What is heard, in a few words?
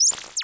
Science Fiction Sci-Fi Electronic Radio Frequency